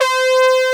JP8 BRS, Jupiter 8; Greytsounds VOL-1

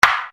Snare Dr

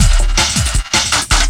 LOOP, 160 BPM, Drum & Bass

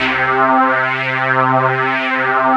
heliceptor; Best Service Dream Experience

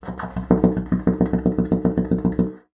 BASSOON; Xsample VOL-6

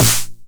909 SD Tape Sat

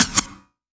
Yamaha CS1x Drums

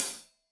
Hat Loose, Hi-Hat, Cymbals Stereo

